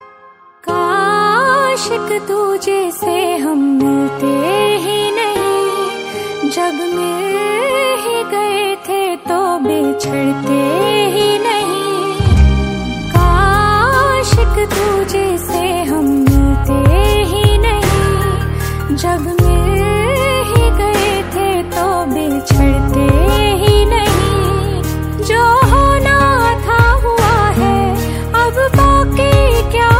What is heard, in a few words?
female